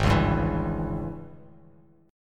G sharp Major 7th Suspended 4th Sharp 5th G sharp, down, Bass Standard; strummed; chord